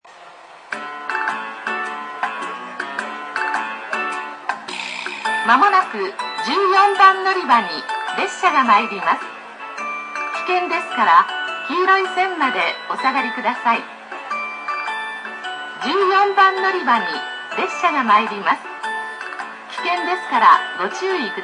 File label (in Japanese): okayama sekkin, ekihousou; sanyo-line